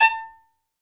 SOLO VIOLIN; AKAI S-Series CD-ROM Sound Library VOL-7

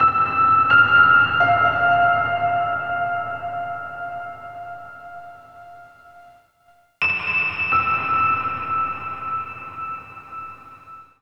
PNO; Best Service ProSamples vol.03 - Drum & Bass 1; AKAI

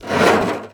Prepared Drums

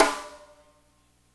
FUNK SNAR; Spectrasonics - Burning Grooves CD2

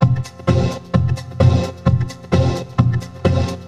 Cylon Beats (130BPM)

